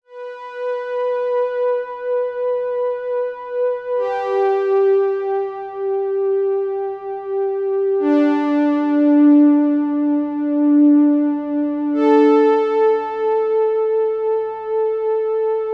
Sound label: chorus pad raw